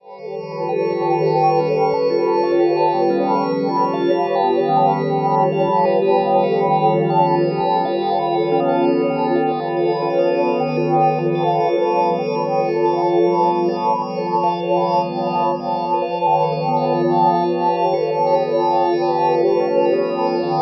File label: Fly Over, Harmonic Atmoshperic